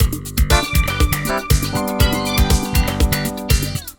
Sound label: Classic Pop, ringtones; Google; NewWave Labs